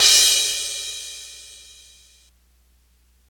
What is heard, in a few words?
Large Room Open Hi Hat One Shot F# Key, Royality free open hat sound tuned to the F#